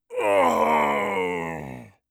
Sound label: death, orc voice; Orc Voice Pack